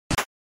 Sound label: RPG Chest; SNES